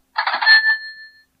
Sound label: Wiimote Sound; Sonic